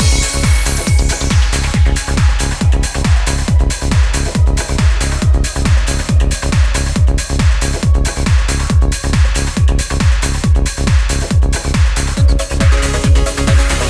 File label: menu music loop; itg